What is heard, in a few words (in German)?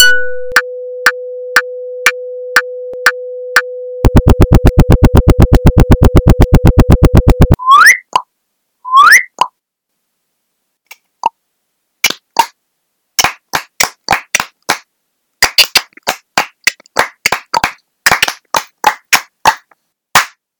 Klingelton